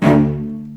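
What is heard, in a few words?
D1 arco short cello, left; East West; The Ultimate String Collection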